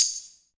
PER TA, Percussion, Tambourine

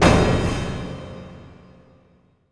104 WORK CRH, CYMBALS, Industrial Loops; USB Soundscan vol.24; AKAI

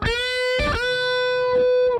DRIVER LICK, Funk Guitar; 90 s Sample CDs; Zero G

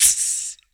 VOC DRUM, MOUTH PERC; Best Service Hyper Dance

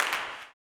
CLAP, Blues Soul; Spectrasonics Vocal Planet

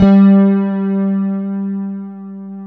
E-RHODES; Best Service Advanced Media Trax Modern Composer

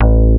Ind Bass (A), Synth Bass

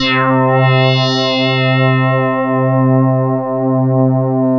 SYN, SYN Analog

